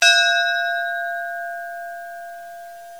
brass bell

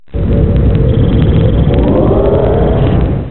Pwrup

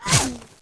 whizz; weapons

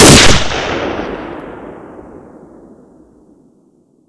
enfield sniper shoot; weapons

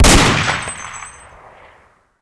MP44 shoot; weapons

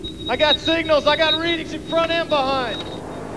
signals; alien

